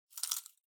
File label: Pocket Watch Chain Noise; 懐中時計02-10(チェーン ノイズ)